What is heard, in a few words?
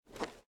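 footstep armor; Smite; Baron Samedi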